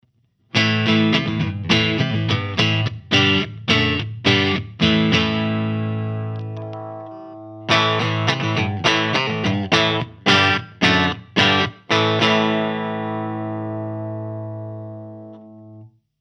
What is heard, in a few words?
Strat Marshall Clean